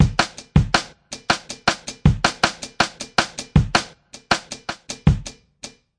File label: 080 strange mermaid, drum loops and breaks